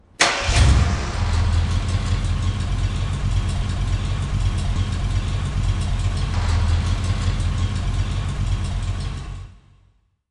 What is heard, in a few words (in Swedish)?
bil som startar